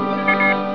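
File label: sound beep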